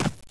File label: step